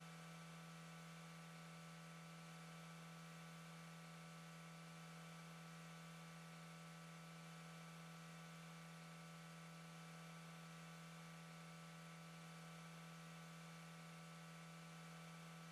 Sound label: car engine rave